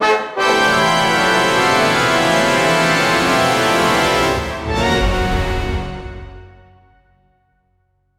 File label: hyper speed mega win, circus